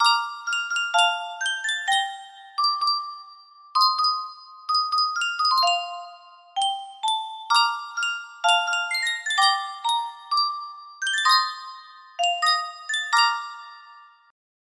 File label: music box; sankyo